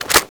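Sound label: weapon foley rpd reload close; Call of Duty Modern Warfare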